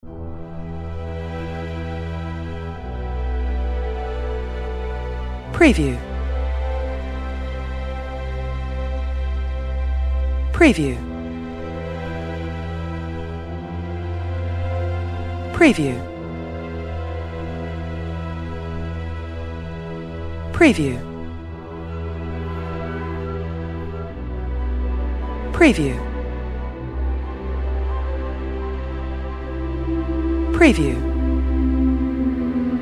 Emotional Strings And Voice - Dramatic Film Score Sad Soundtrack